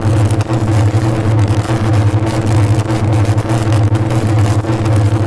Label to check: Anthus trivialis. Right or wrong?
Wrong